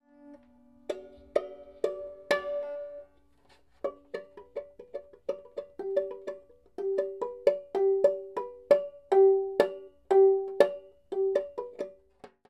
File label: Banjo